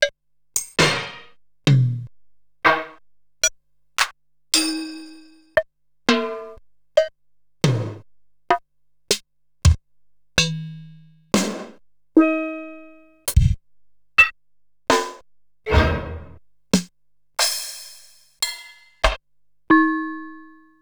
Ensoniq SQ-R Plus, Samples Sliced; m8

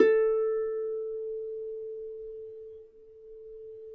A3 HARP; USB Soundscan vol.62; AKAI

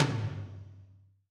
Lo Can, Alesis SR16

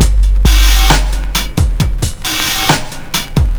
Slomo 67 bpm, breaks; Zero-G Beneath the Planet of the Breaks

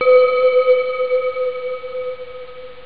bell; ST-XX Amiga Soundtracker Sample Packs

